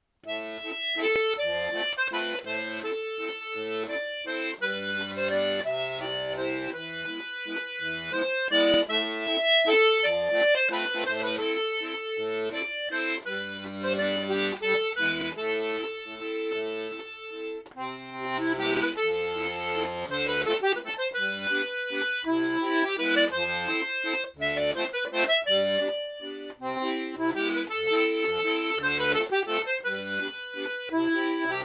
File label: BENNY valse